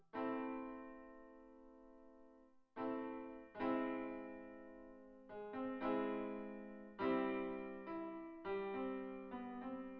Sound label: no drums synth conv 5cm; SASSEC07